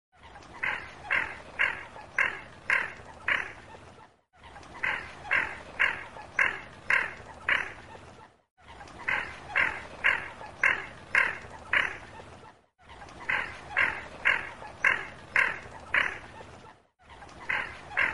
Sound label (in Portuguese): canto do zarro malgaxe